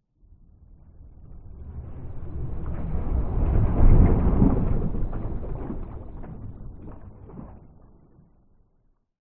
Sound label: nature water underwater large rocks ice or other debris drop into deep water